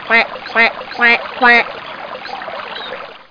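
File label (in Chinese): yazi jiaosheng, duck quacking; jiachu jiaosheng; jiaqing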